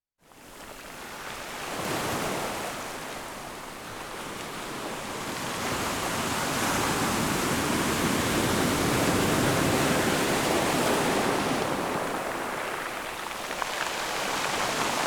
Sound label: Ocean Waves